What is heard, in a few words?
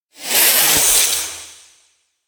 elec bigserpent roar; anemoi